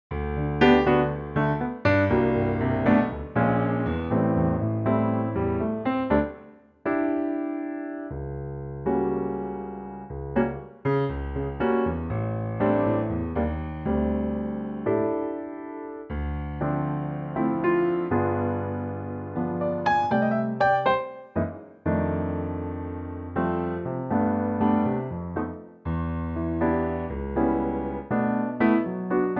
G piano trak

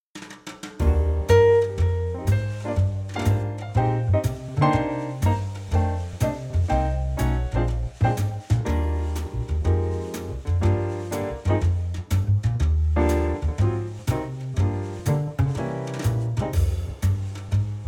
Eb trio

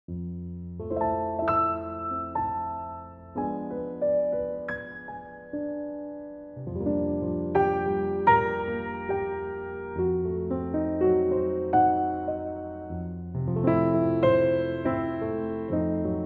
F piano

